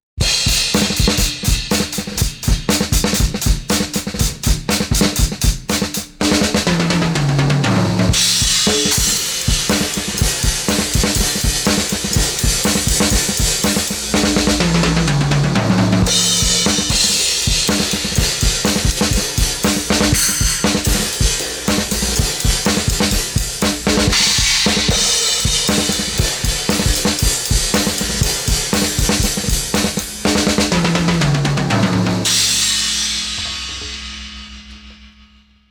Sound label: drum all BOOST